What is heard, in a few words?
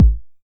909 BD; Goldbaby Tape808 & Tape909; The Tape 909